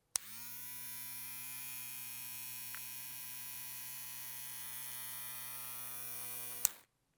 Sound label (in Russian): zvuk trimmera britvy